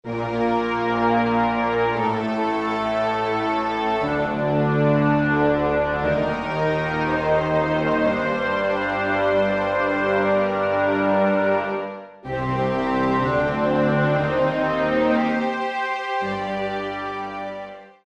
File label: Full Orchestra, Roland SR-JV80-02 Orchestral